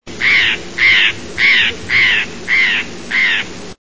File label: Steller Jay; birds